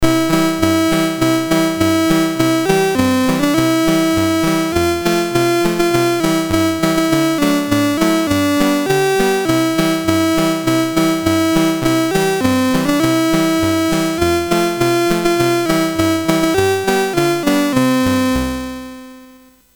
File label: Christmas Album BASIC, Commodore MOS6581R3; C64